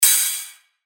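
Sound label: Cymbal; revealed percussion vol 3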